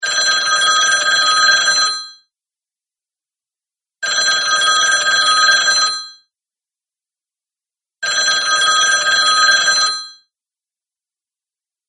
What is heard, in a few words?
Party Phone Ring; ringtones; Classic Rings And More